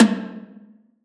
tom empty, Drum One Shots; Slate; SD MIDNIGHT Sample Pack

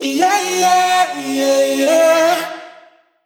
Vocal Sample Pack Phrases C#m; Slate Digital Omnivox Vocal Sample Pack

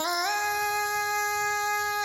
Vocal one shot Ab

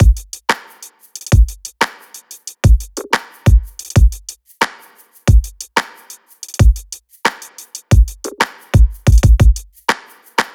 drum loop 91; Pop; Drums; Full Drum Loops; belday; Slate; SD PASADENA Pop Sample Pack

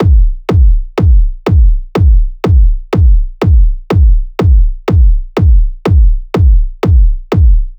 kick 123, Kick Loops; drum sample pack; Kelut; SEISMIC; Slate

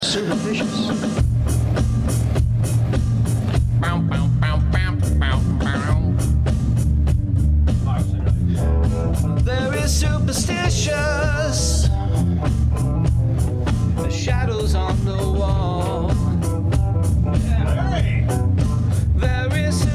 Background Chatter

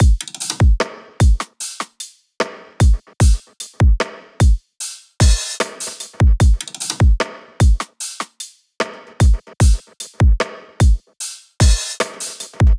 drum loop 75; Pop; Drums; Full Drum Loops; Slate; SD PASADENA Pop Sample Pack

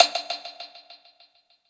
percussion oneshot; runaway; Slate; SD PASADENA Pop Sample Pack

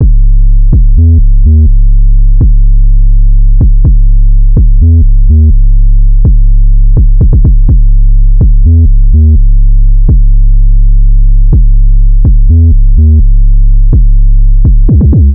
Synth loop 105 C#m, Synth Bass Loops; Slate Digital BASSHOLE Sample Pack

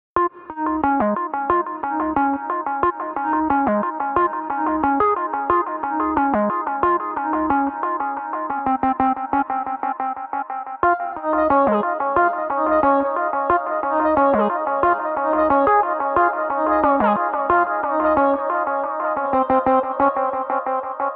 songstarter 90 C#m; Slate; SD PASADENA Pop Sample Pack

